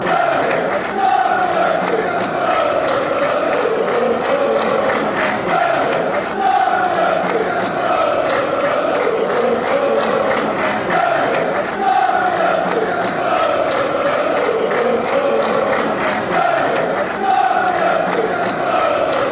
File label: nec chant